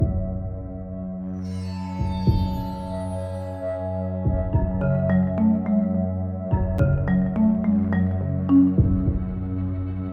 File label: Inside Whale; burly men at sea ios macos ps vita ps4 switch windows gamerip 2016; Whale Hatch